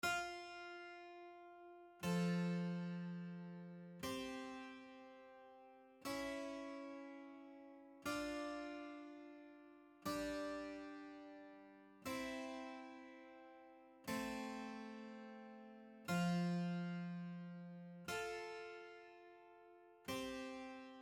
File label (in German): Zweistimmig Erste Gattung